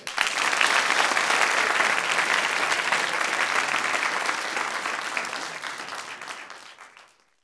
applause

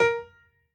piano hi

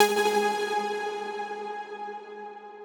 soft hit whistle; osu

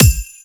drum hit whistle; osu

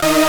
synth; osu

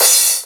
cymbal

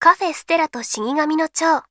applause

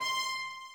High Violin

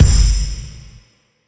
Glitter

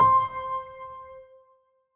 piano; osu

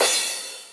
soft hit finish

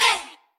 Percussion Hey a#

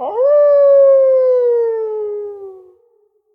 combo break; osu

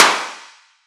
Clap

